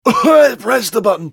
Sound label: Cough